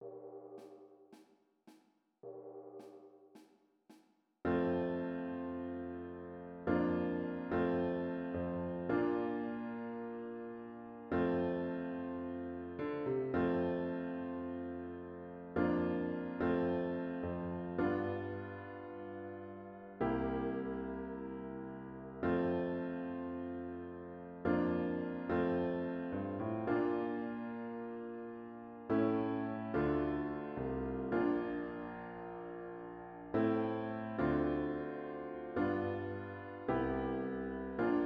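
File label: Spiritual accompaniment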